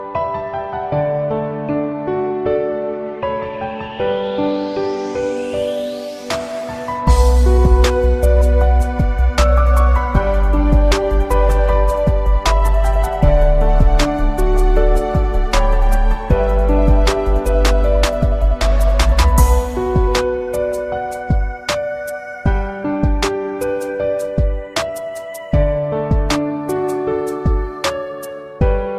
LOST LOVE BEAT

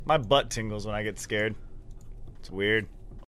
Scared Tingles

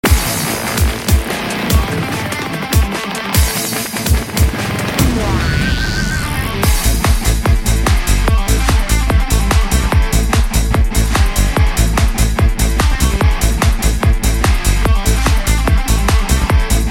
Psy Guitar

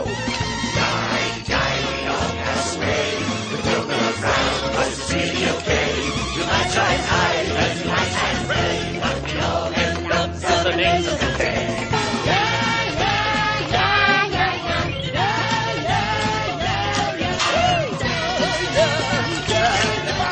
robot remains, electronic ringtones

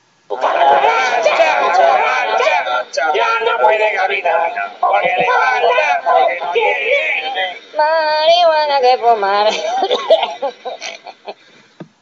la cucaracha

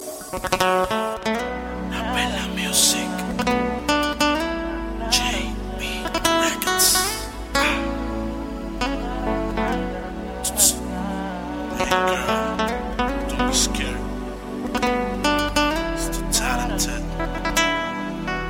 Brazilian Guittar

